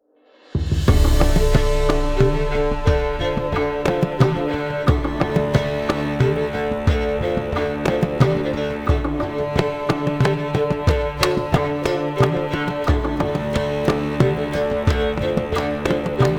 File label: tavern Arranged; Novigrad